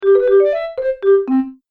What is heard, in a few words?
Happy Cartoon Musical Ending